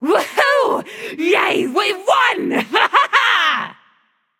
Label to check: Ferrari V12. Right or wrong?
Wrong